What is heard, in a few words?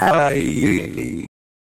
aaaaaiiii